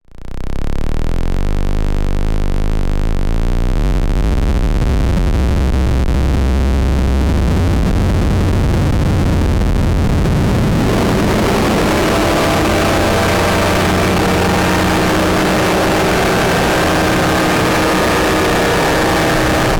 Erica Synths Liquid Sky Dada Noise System 2 VCA Feedback Spring Feedback